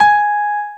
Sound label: soft hit whistle